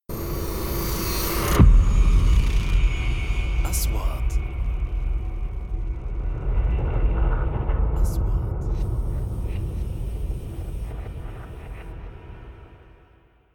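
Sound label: 3D SFX Trailer; مؤثرات تشويقية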